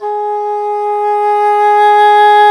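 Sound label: WND English Horn, Swell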